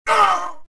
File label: choke; Star Wars Jedi Knight II Jedi Outcast; XBOX; reelo